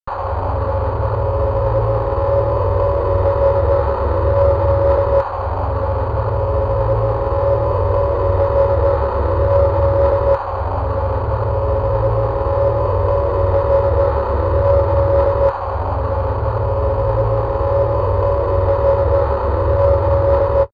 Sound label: Radio